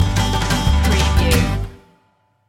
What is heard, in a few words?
hickabilly hoedown stinger; outrageous